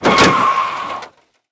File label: drift